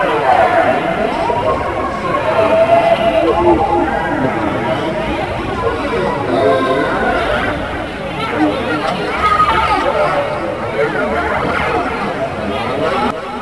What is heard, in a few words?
KIDS, Ambience